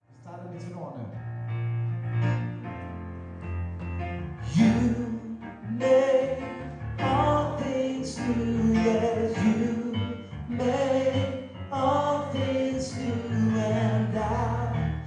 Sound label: moving forward tenor